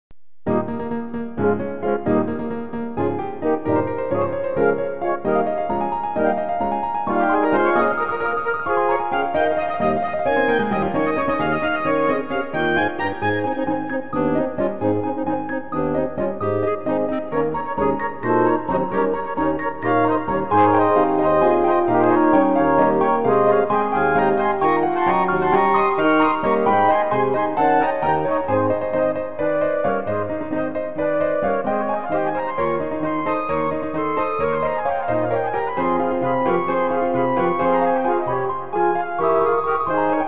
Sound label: divertimento festiva